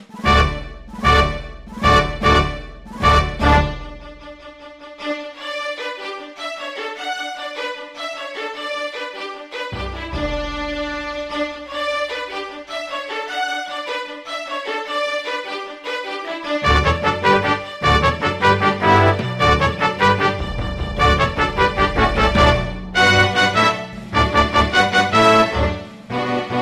Strings, Brass, Flute; VST; The Magnificent Seven; Syntheway Strings

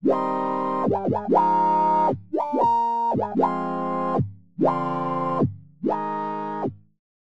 Wah Wah; Waverator Spectra; Delirium; syntheway

